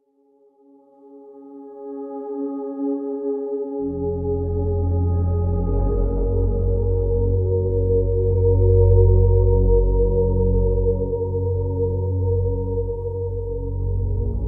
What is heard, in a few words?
Soft Heartbeat Rain